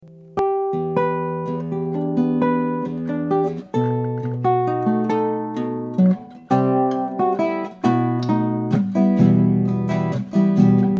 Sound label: Traditional Living room recording No sound effects, guitars; DAT recording by Silvestre Borras; no equalizing